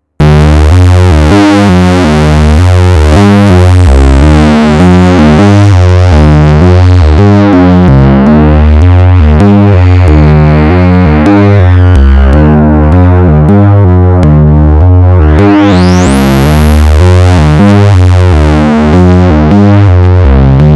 Dominion X Feedback

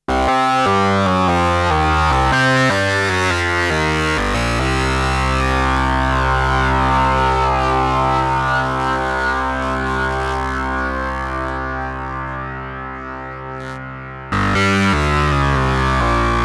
Two Voice Pro Flanger, synthesizer

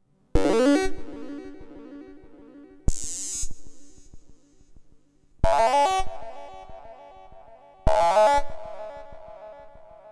hpf

